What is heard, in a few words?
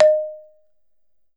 BALAFON, African Melodic; World Combos; E-MU Producer Series Vol. 7 – Old World Instruments